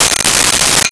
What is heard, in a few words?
Bell, Metal